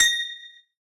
beep; HeavenStudio; fillbots

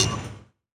rocket prepare; HeavenStudio; launch Party

